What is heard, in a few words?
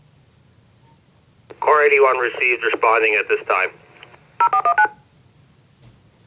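DTMF Unit ID, radio sounds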